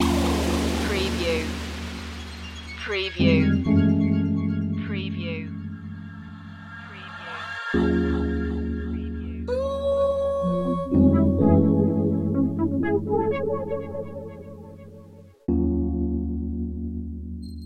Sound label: feel the beat loop